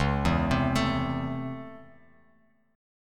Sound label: Db Minor Major 7th Sharp 5th, Bass Standard; 9,7,10,14; arpeggiated